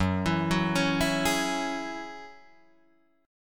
F sharp 7th Suspended 2nd F sharp down Guitar DADGAD